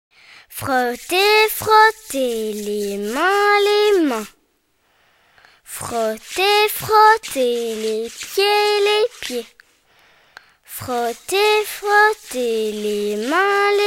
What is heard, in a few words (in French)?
frotter frapper marcher